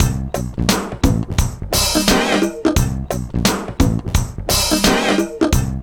LOOP, Funky Ass Loops; East West

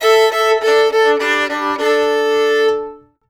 FIDDLE FX; Sampleheads; Whole Lotta Country 1 & 2

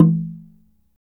SFT SLIT DR, Heart of Africa; SLIT DRM2

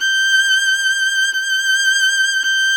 VLN G5; Sonic Reality; American Heartland

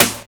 HACK SNARE; Drums In Your FACE!

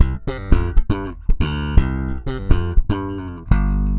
SA BS C9, BASS SALSA

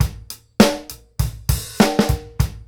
groove, Grooves 99-132bpm

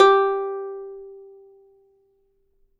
Plucked Lyre G4; Polyend; Simulacrum Sounds; 1 Shots

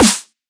Snare; M8; Electronisounds

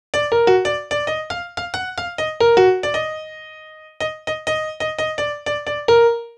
Piano Solo, Cinematic Funk; Sony Creative Software; Cinematic Funk Urban Tone Poems

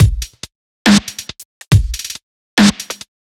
140 drum loops; Steppin; M8; Electronisounds

